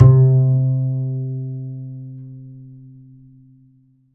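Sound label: double bass C3, Jazzistic; DBASS; Univers Sons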